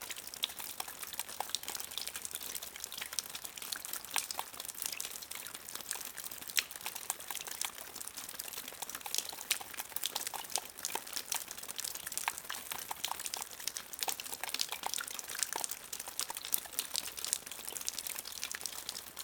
sprinkle; Original TK Sounds

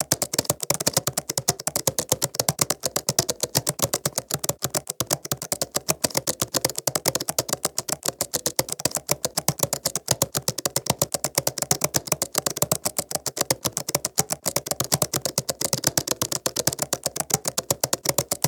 typing; Golden Crayon Sounds

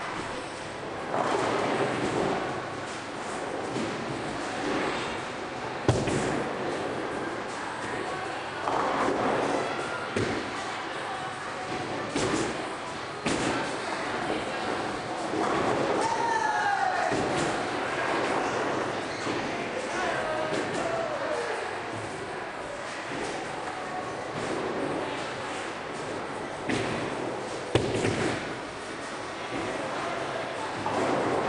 bowling alley; Golden Crayon Sounds

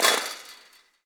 fence land; Golden Crayon Sounds

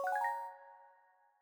focus Mode; NVDA; Random NVDA Sound Scheme Version 2

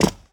carpet land; Golden Crayon Sounds

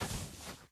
cloth step; Golden Crayon Sounds

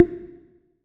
smart sticky mode off, Reverb

